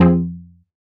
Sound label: stab donk F; stabs